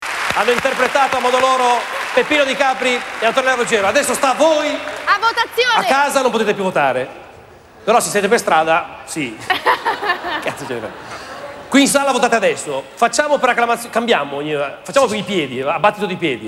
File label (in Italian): applausometro